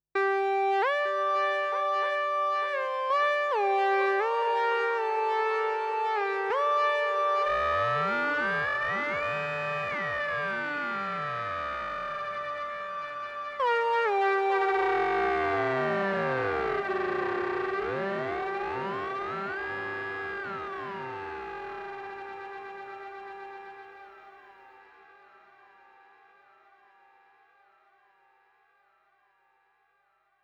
ms20 ring modulation, synthesizers

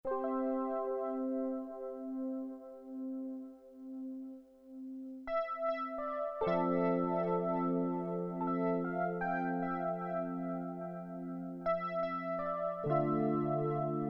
mks piano, synthesizers

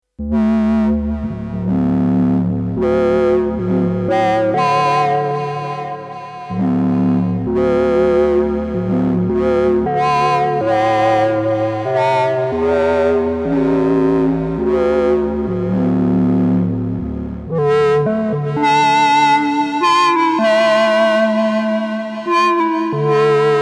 dirty, yamaha sy 1; synthesizers

